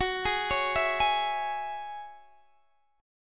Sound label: Gb 7th Suspended 2nd Gb, Guitar Open D; chordshape; down